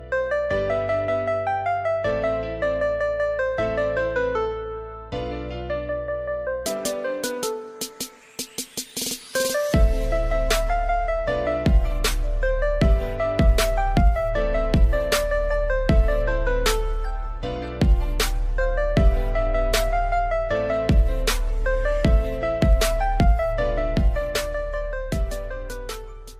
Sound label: hindi ringtone, bollywood; bollywood ringtones